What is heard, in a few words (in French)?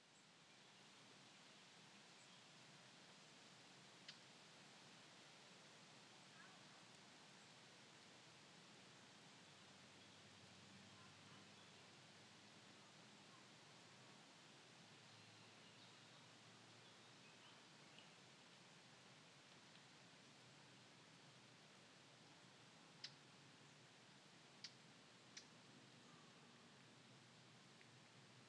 Oiseaux Discussion Enfant Vent, Très Ancienne Carrière Lac